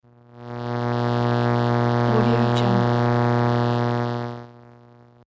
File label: electromagnetic interference